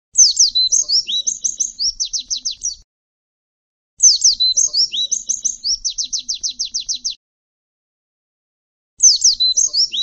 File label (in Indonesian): suara masteran spesial pleci